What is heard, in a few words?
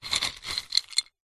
food savory snack wasabi peas in ceramic bowl hand in movements; zapsplat